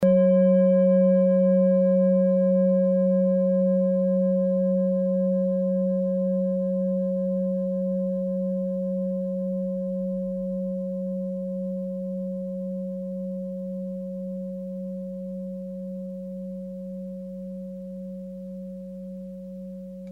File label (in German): klangschale orissa